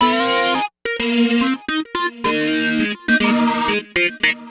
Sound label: Funky Motiff, Keyboards